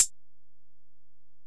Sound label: HiHat, Alesis DM-PRO